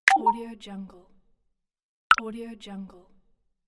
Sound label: ui button; jbobstudio